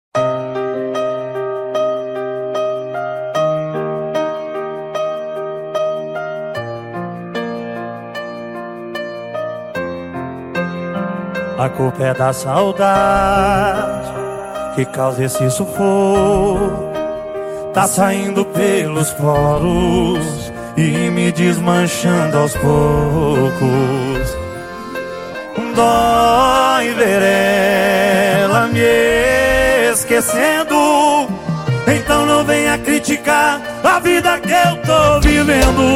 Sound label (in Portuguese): sertanejo